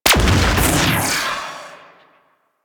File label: Decoy Counter Measure Shot Initial